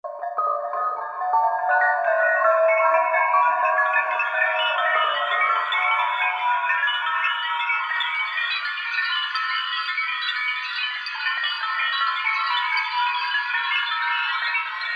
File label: Shell Loop; Korg MSC-10S Ethnic